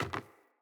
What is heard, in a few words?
Sandals Land Wood, footsteps; Player